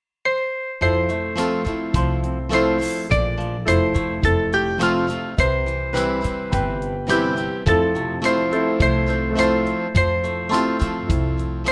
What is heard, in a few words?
Band; O Land Of Rest; Miller